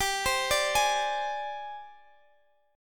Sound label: Ab Major 7th Flat 5th G, Guitar